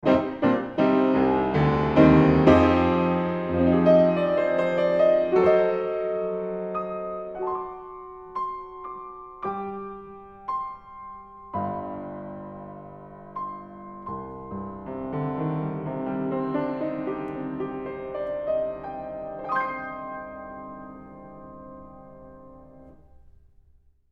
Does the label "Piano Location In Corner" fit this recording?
Yes